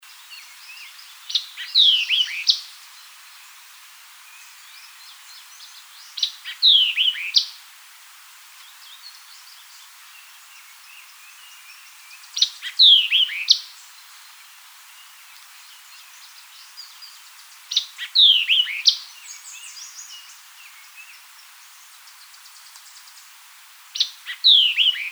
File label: White-eyed Vireo